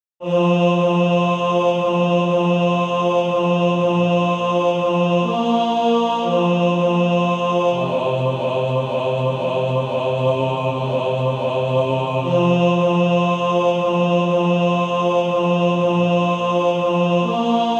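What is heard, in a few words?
Male Choir, bass 1; Sweet Hour of Prayer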